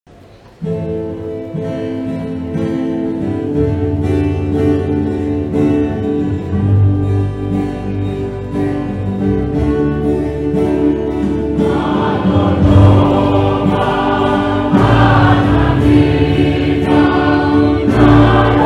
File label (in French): chant de communion; oceanie